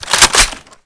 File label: new lmg bolt pull; garrysmod